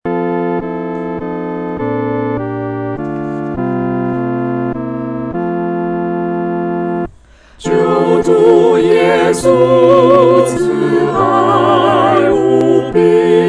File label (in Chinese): 合唱 四声部